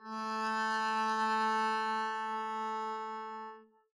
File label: arco a3 p down, double bass; dsmolken